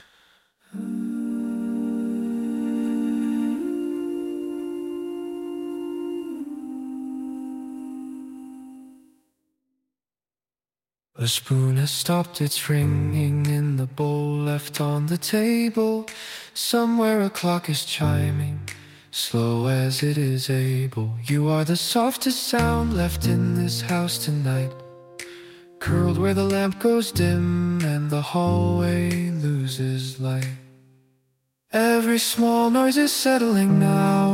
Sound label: lullaby, name songs; eira